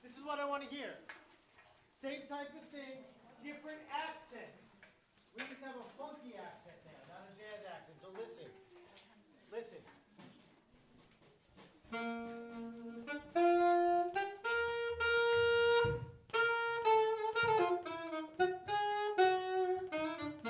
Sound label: us funk accent example